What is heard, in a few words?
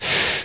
breathe; jazz jackrabbit 2; M8